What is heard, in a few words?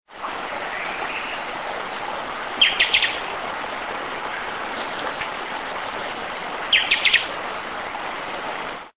Sporophila frontalis Pixoxó